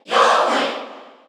Cheer Korean; SSBU

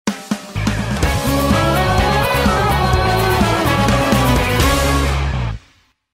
Victory Theme; ARMS

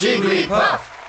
Cheer; Jigglypuff; Melee

